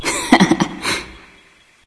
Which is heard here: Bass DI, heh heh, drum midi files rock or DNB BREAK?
heh heh